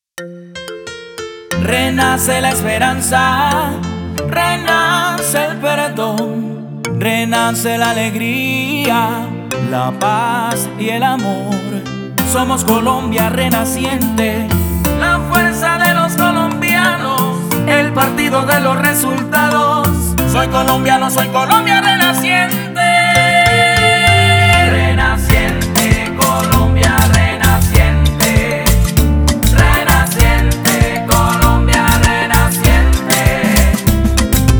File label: RINGTONE; COLOMBIA RENACIENTE